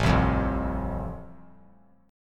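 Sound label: A Minor Sharp 5th, chord strum; Am#5 strummed; JGuitar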